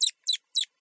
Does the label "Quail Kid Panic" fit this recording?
Yes